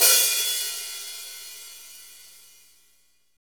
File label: HAT, Roland Fast Rock Hi-Hats